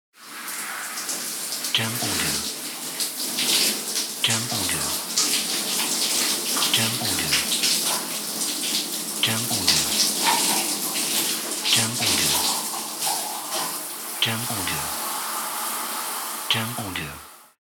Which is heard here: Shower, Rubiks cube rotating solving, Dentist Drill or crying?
Shower